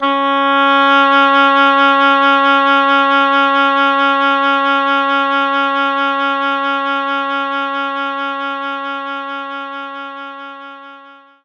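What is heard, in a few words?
Oboe C#3; knights try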